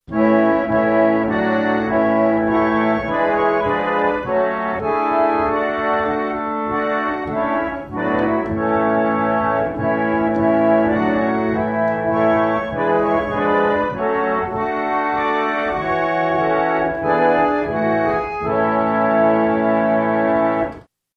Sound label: Organ, Church; Single Small Pipe Organ With; Peddle Taps, Pumping Whooshes In Background. Medium.